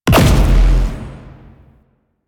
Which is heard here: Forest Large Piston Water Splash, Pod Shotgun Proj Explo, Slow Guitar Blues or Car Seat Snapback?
Pod Shotgun Proj Explo